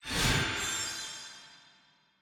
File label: key Popup; codex of fortune